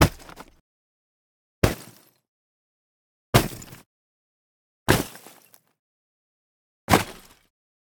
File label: thick ice hits impacts debris bright; sonniss gdc